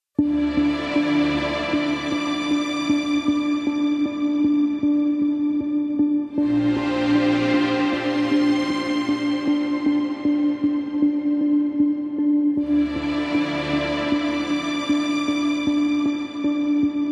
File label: Documentary Soundscapes Light Serious with violin solo